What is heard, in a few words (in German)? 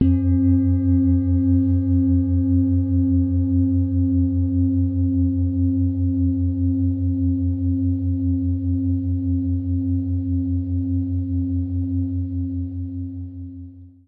Klangschale; Jupiter Erdenjahr